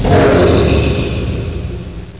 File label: grunt